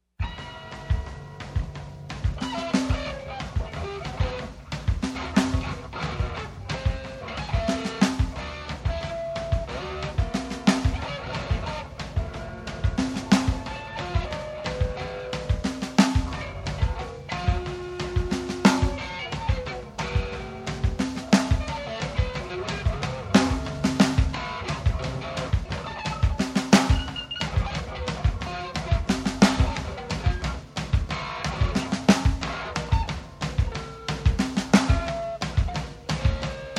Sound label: Gtr. Drums; Knitting Factory Works